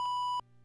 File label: Beep Long, ct-24; classic machines